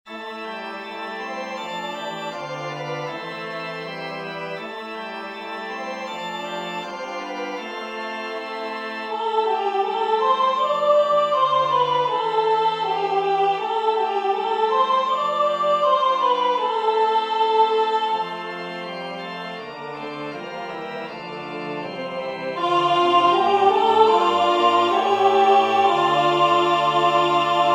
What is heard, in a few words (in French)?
Jeanne pur joyau de France alto